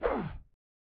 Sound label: Throw Ball; Champions World Class Soccer; SNES